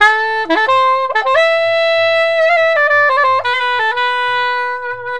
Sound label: SAX